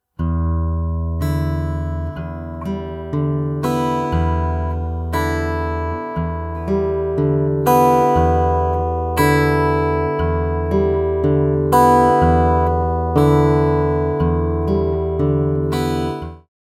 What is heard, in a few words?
Fingerstyle, acoustic guitar; alternating bass fingerstyle pattern; Nigel Wheeler